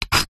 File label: stone small single scrape on rock or brick; zapsplat; foley